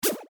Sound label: question appears